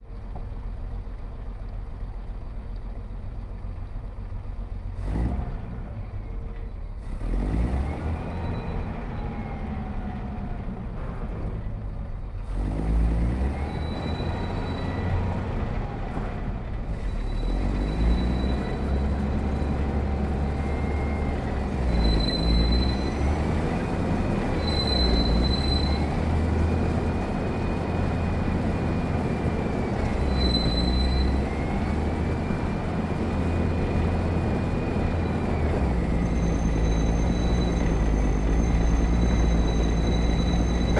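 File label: hotchkiss h39 onboard medium drive various off cannon right; mkh8020; Sonniss GDC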